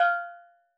SteelDrums F5; Calypso; Percussion

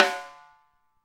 SD Real, Roland; Sound & Vision - Gigapack I

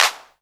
CLAP, Dance Drums

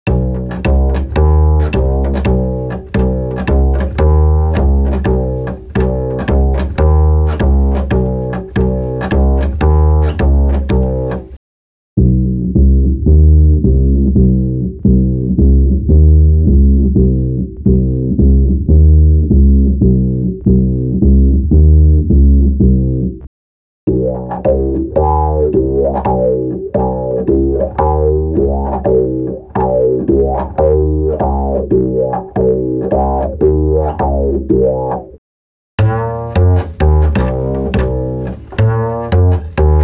breaks, Jungle; Zero-G Jungle Frenzy 2

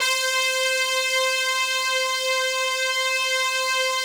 PAD, DIGI-PADS

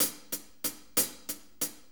6-8 HiHats 60, HiHat & Ride Loops

